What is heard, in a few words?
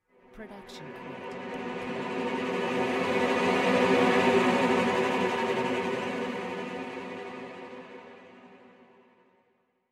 pont cello cresc dim